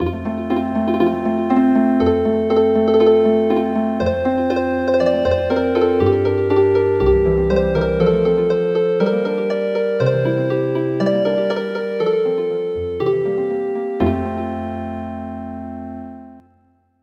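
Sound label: Healing Harp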